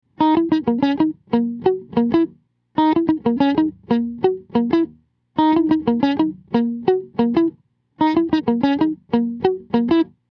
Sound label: clean neck; Clean, minimal compression, neck pickup